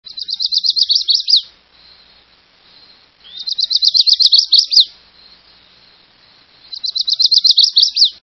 Chestnut sided Warbler

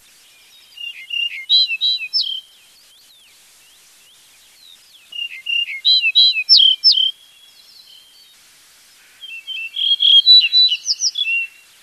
Yellow breasted Bunting, songs

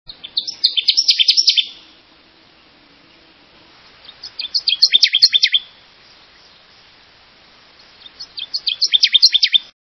Connecticut Warbler, songs

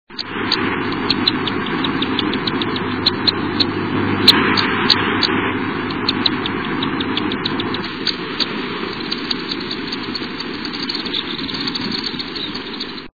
Black Swift

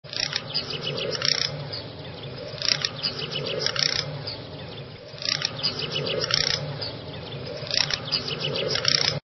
Garganey, songs

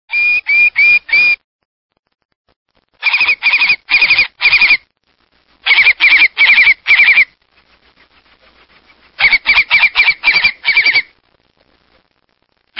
Long billed Curlew

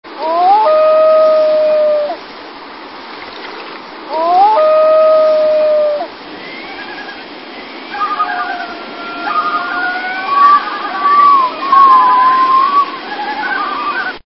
Yellow billed Loon, songs

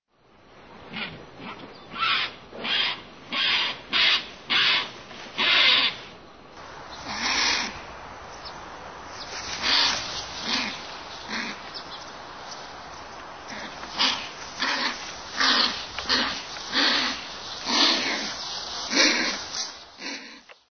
California Condor, songs

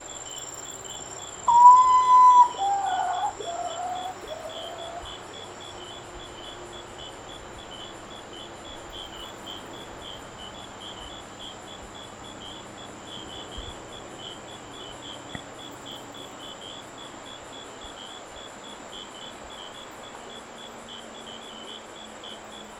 Common Potoo

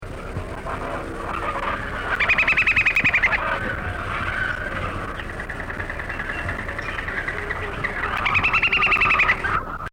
Hook billed Kite

